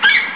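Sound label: eagle screech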